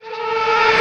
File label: Tonal FX A